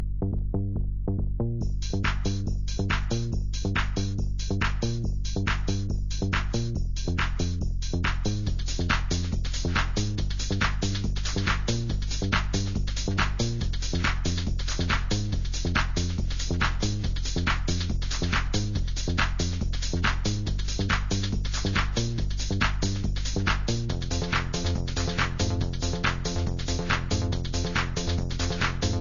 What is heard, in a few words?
Techno